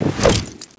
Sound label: Rifle Whip, weapons; dod